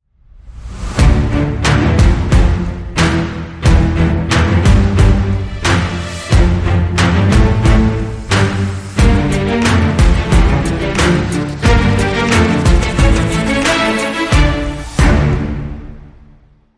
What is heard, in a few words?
Hybrid Sting